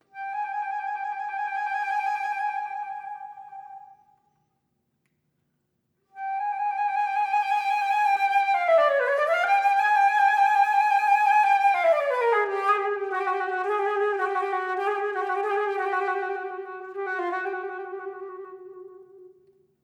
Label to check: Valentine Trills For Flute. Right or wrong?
Right